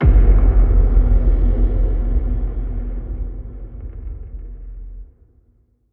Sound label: impact